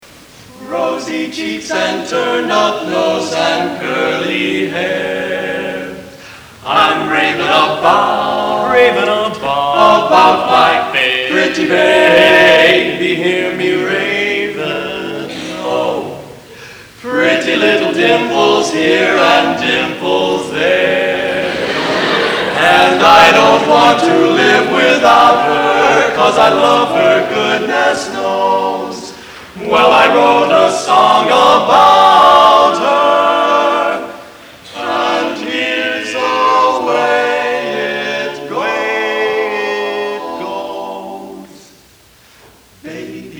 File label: Mens Quartet; Miami U